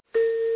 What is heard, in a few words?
ding